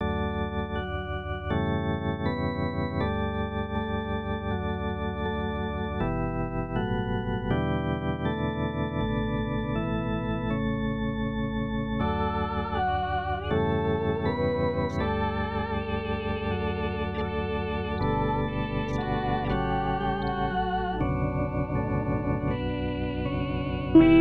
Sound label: Agnus Dei Tenori; Visconti